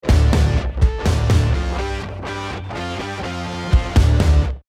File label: deathcam